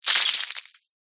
nature ice pieces broken many fall onto hard ground